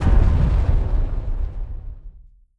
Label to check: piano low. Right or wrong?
Wrong